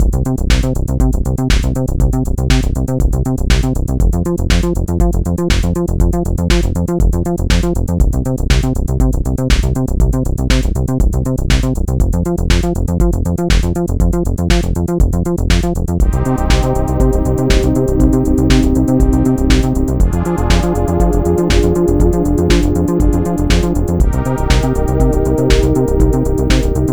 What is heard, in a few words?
level1, music; DemoPlatformer